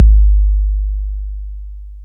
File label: TR-808 Kick, Roland TR-808